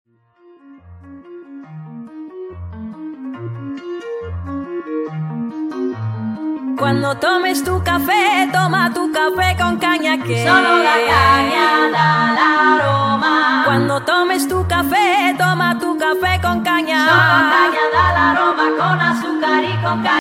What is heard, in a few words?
noha ringtone